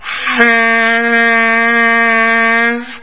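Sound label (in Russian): саксофон одиночный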